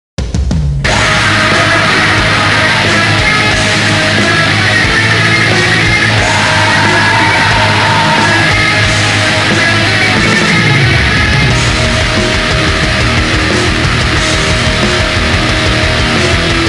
Black metal

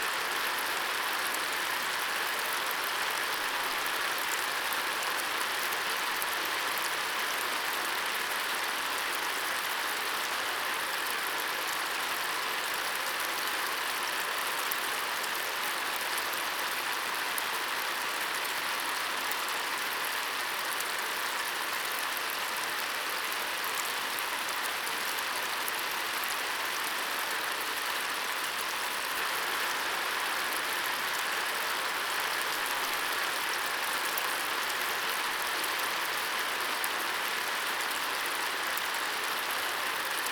Raindrop Shower

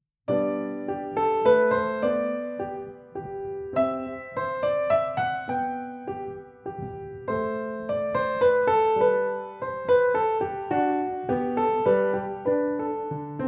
Menuet in G majeur BWV 114

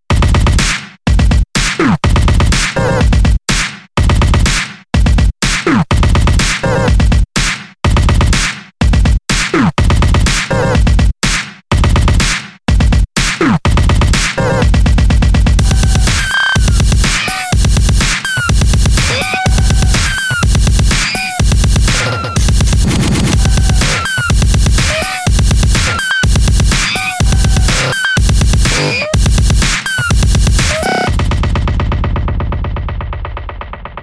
Instrumental

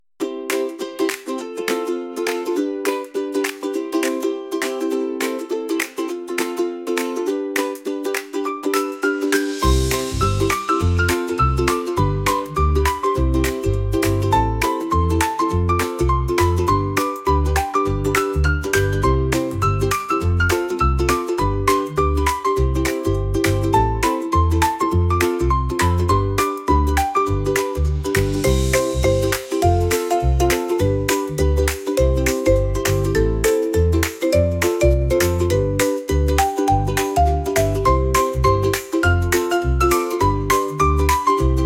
Happy and Childlike Ukulele

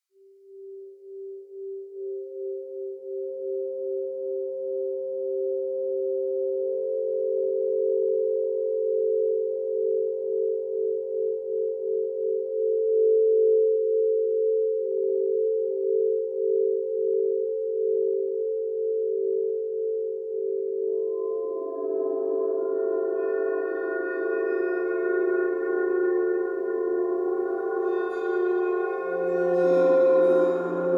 Asian Zen Spa Music

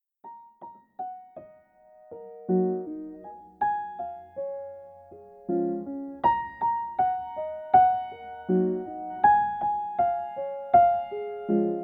Chillout Piano

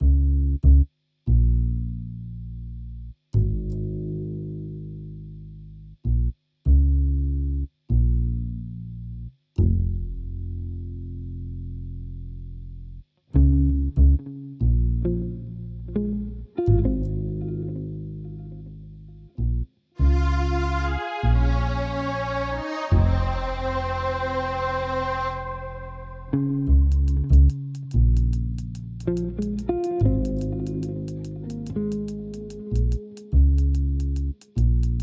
Armenian Duduk Relaxing Ocean Waves Lounge

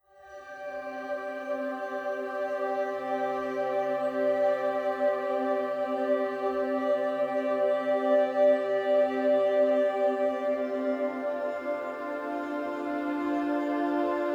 Entspannungsmusik Deep Sleep, Textures

